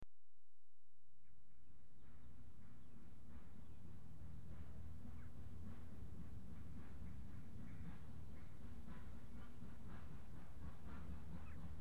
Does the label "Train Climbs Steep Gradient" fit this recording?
Yes